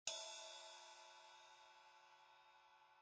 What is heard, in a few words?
stick ride; Lounge & Reggae; Loopology; Be Bop Baby